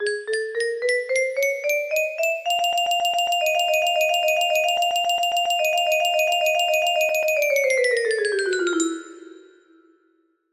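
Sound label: waltz, music box melody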